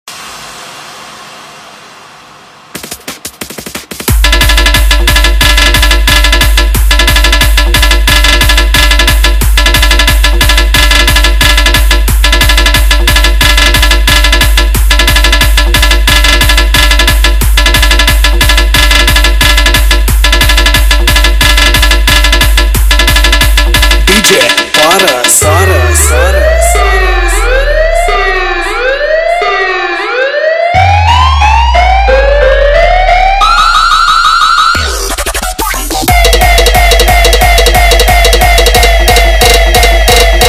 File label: Police Siren Music Competition Mix Dj Song